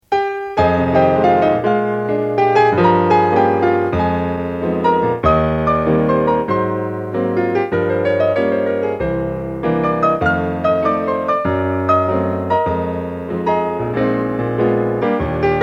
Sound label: piano